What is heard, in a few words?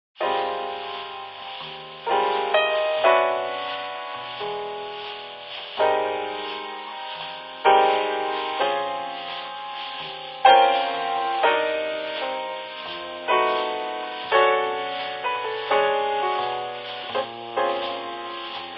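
Music Ambient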